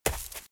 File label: Footsteps - Leaves - Run - Left; RadwinIsland